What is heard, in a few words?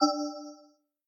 Button Spacey Confirm